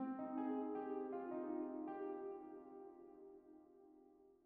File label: melodic arpeggio; maia; arpèges; seeding-planets